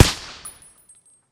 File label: m4a1 silence; emx ares